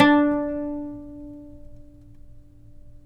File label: PLK OUD D; Roland - LA Composer vol. 3